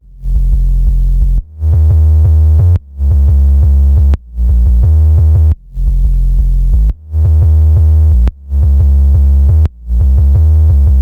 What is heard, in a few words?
sub bass; drum and bass